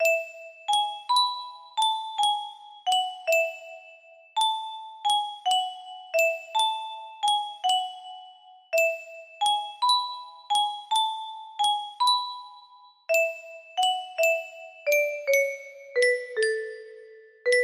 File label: music box